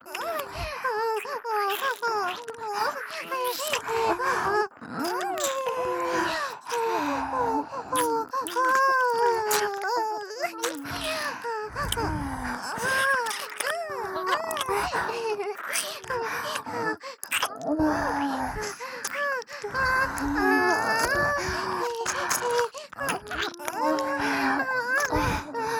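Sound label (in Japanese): 演技喘ぎ＋両耳舐め; 美冬; 小春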